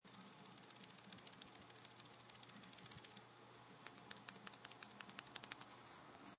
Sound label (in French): bruit iphone 5s